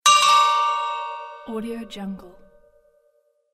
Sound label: lost game over bell; fxprosound